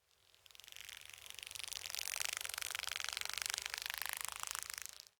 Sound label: Forest Open Glade Grass Hopper Fly By, Day; Ambience